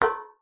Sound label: impact metal pole hit gently with small blunt object